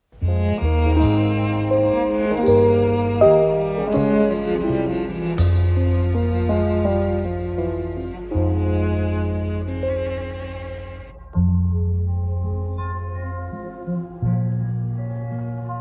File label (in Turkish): karaoke turkce